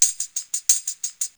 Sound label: Tamb 12bit 120 bpm; Loops; Drums; Percussion; Ableton Live 12 Lite; Core Library